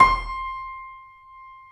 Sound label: KEY C 5, YC7 Piano mf; Roland L-CD701